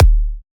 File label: Punchy Kick C0